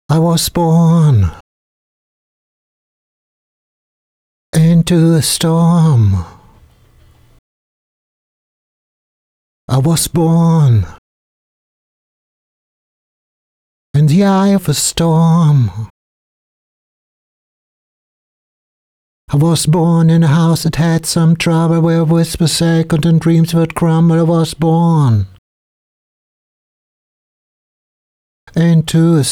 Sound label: vox high